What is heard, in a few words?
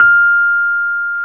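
Piano Rhodes; Electrics; Club-50 - Foundations Roland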